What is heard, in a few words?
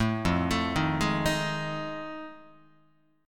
F 7th Sharp 9th Guitar, chord; arpeggiated; F7#9; 0,1,0,1,0,2; JGuitar